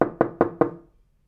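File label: knock on wooden pillar; knocking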